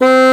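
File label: bassoon C4; East West - Global Sounds; WOODS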